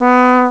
TUBA; CMI

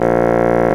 Bassoon; CMI

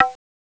TABLA; CMI

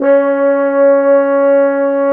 Brass Mute; CMI

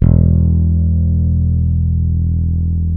Bass El Full E1; CMI

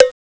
BELL; CMI

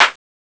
CLAP, CMI

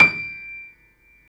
Piano Grand; CMI

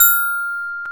Glock; CMI

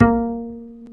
Bass Acc; CMI

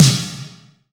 Snares; CMI

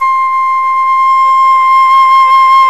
Flute; CMI

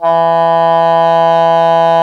Cor anglais; CMI

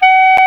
Clarinet; CMI

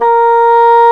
Bassoon; CMI

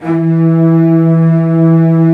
Cellos; CMI

